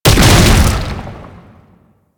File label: Land, Foley; Enemies; Warframe; Proto Infested; Babau